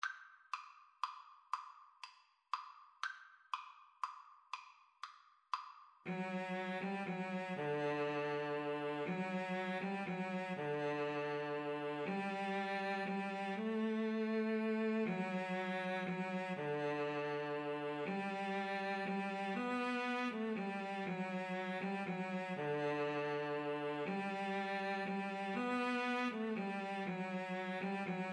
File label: violin cello, Violin-Cello Duet; silent night